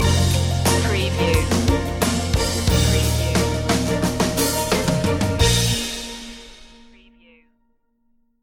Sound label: wonderful journey stinger